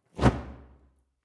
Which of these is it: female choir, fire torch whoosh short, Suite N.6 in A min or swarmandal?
fire torch whoosh short